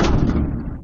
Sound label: blam; BALLY